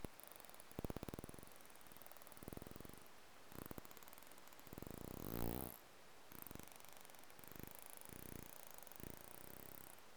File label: whales